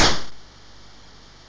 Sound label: sid MOS6581R2, GAMES; Face Off